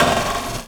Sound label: SHATTER, Anime Sound FX; AKAI; FX SIGNALS